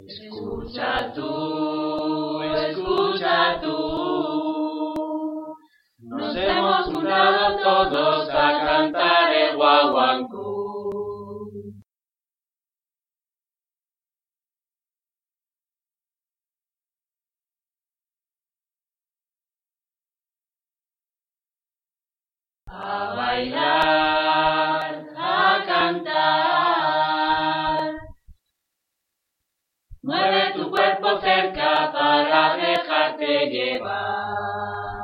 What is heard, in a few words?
Choir